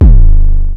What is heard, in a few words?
Roland Sh-101; Drums